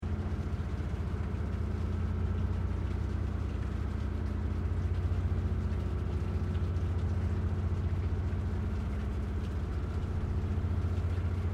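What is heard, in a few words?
Boat Construction Dredger Idle With Bilge Pump Loop; Boats, Nauticle, Transport, Vehicles, Watercraft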